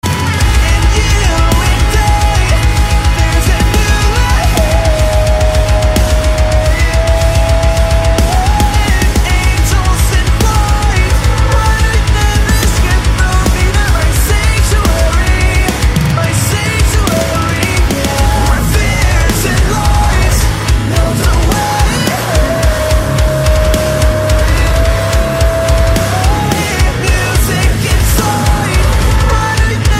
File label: Metal; Sanctuary